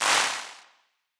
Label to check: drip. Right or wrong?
Wrong